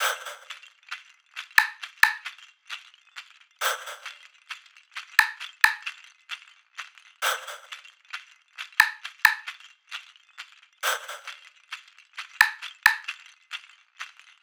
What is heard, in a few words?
perc 133; Drum Sample Pack; Loops; SD SEISMIC; Slate